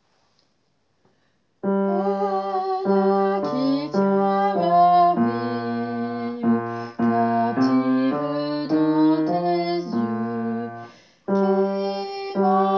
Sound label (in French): belle basse; Belle qui tiens ma vie